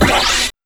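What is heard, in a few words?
wet synth; E-Lab; X Static Goldmine 1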